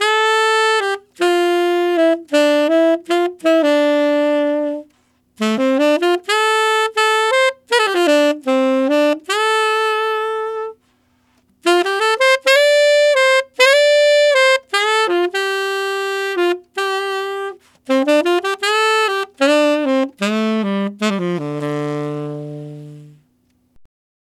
Tenor Rock Ballad in D min, Tenor Sax Loops, Jazz; Sonic Foundry (Sony Creative Software); Jazz Solos and Sections

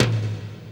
tom, dance drums

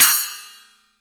CYM, Real Drum Kit; Best Service ProSamples vol.43; JUNGLE KIT

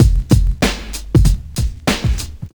TRIP 90, trip loop

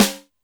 Snr Classic, Alesis D4 drum machine

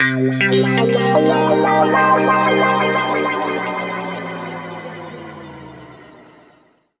C P-S WA -R, Guitar; East West; Steve Stevens Guitar Samples Collection; PTCH-SHFT+WA

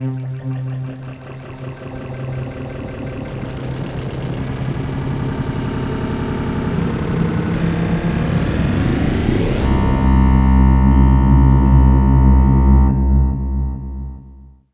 atmo, atmosph, special fx twisted

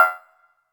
Stabs, Elektron MachineDrum

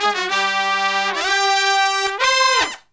Killer Brass Riffs; USB Soundscan vol.29 - Killer Brass Riffs; AKAI

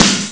super snare; Amiga Soundtracker Sample Packs

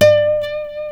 D 5 nylon, Guitars

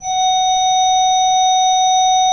SWL F4, Cathedral Organ, SCHWELLWERK; Propeller Island

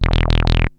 Korg Prophecy hits, arps and fx

